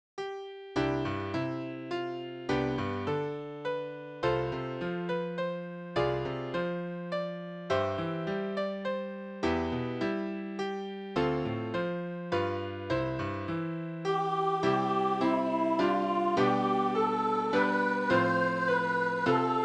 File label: Sisters in Zion SSA with flute or violin